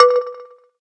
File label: electric, keytone